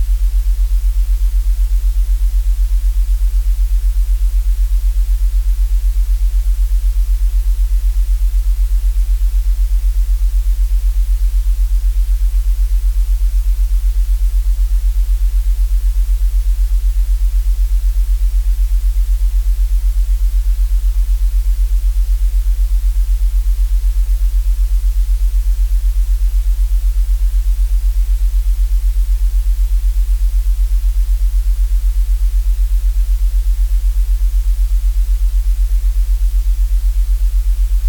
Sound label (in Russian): legkiy belyi shum